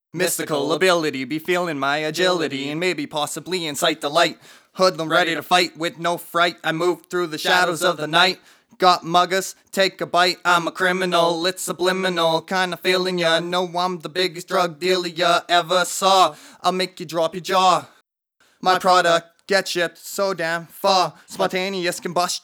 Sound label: acapella 90bpm